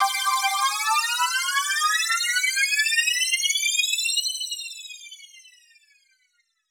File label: TRAP TRANSITIONZ